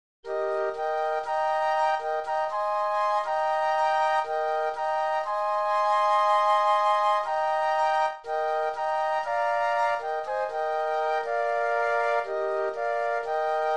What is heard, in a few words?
Flute Trio